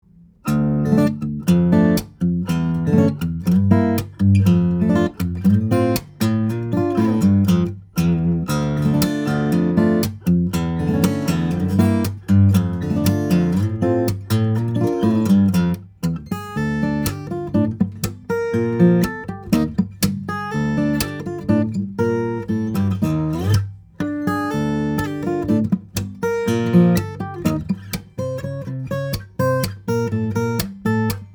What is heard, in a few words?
guitars, steel-string guitars, flattop; manzer cowpoke; koa european aged spruce